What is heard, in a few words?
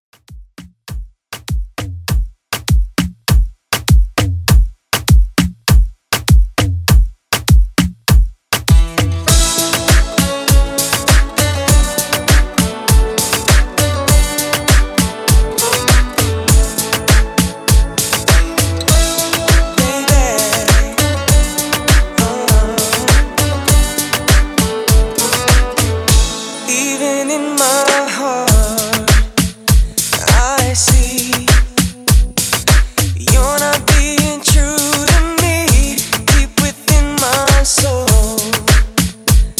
Afro Remix 100Bpm